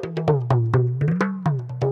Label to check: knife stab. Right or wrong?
Wrong